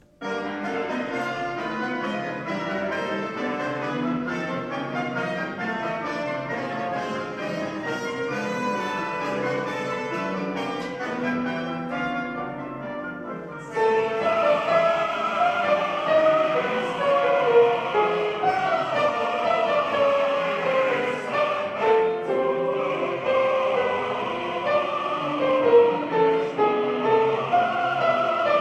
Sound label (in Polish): soprany; cardillac